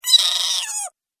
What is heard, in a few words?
Gremlin Pain, monster; horror